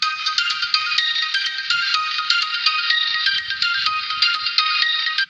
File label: Infinix Hot 10s NFC Sms Ringtone